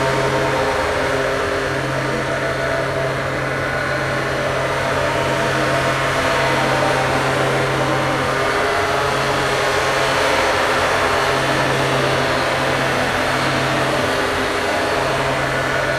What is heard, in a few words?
Worker Drone Hover; Titanfall 2